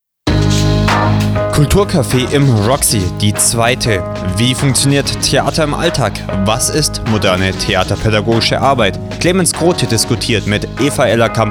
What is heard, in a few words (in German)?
kulturcafe jingle